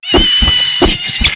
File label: Fly bat Idle; FlyForInsanity